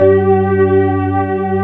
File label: ROCK B3 B, HAMMONDS; East West; Global Sounds